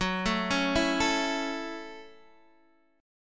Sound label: Gb Minor 7th Gb, Guitar Open D